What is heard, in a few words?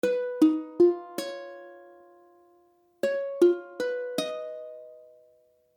Cis, ukulele